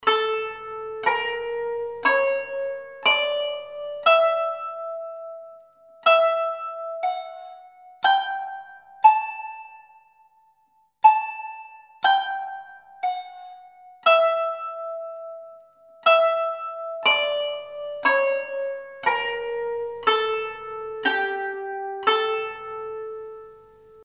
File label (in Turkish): kanun uzzal